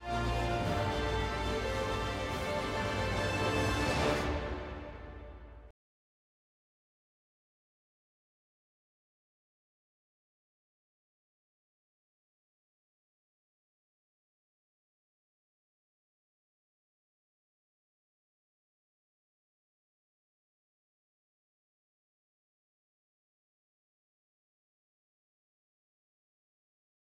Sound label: Weight Room Music; War Room; To Hell and Back